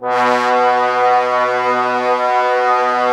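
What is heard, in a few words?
brass trombones B, Woodwind Brass Ensemble; 90 s Sample CDs; Miroslav Vitous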